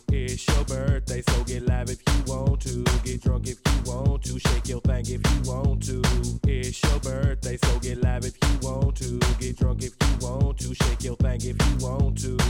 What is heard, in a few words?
birthday song